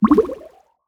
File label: spawn bubble; jellyfish; battle